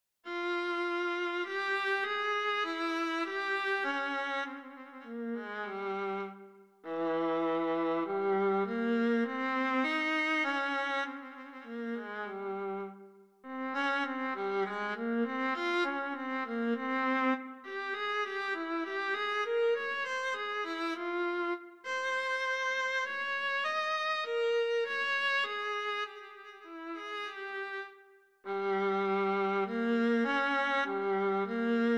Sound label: Soliloquy for Viola